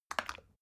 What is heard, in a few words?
bottle syrup upend bright; berufe; gastronomie